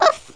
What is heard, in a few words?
Ooff, Speech; Worms2